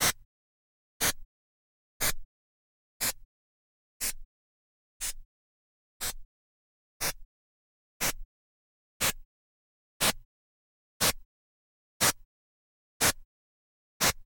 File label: blumlein, noiseburst